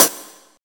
Tamed High-Hat Sample F# Key, Royality free high-hat tuned to the F# note.; hat samples